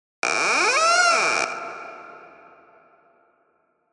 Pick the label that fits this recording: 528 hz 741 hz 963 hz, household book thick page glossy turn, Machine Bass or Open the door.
Open the door